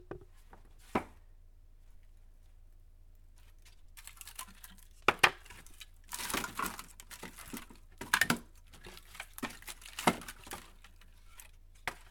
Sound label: Stacking Moving Split Firewood